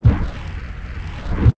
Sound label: wormhole